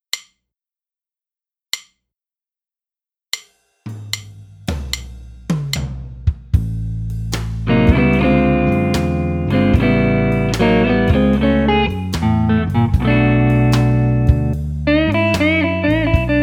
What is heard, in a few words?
Build Up